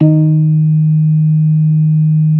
B3 G#2; Best Service; Hyper Dance

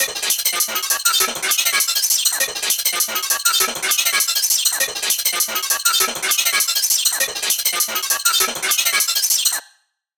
Spectral Hats

